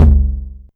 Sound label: Tom Lo, Novation Drumstation 909